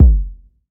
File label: short reverb kick drum sample F sharp key